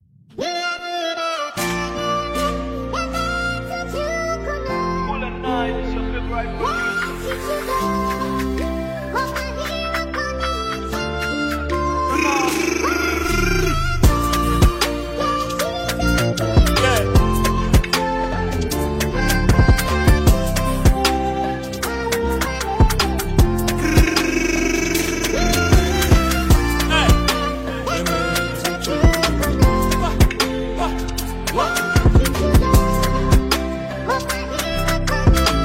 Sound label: Drill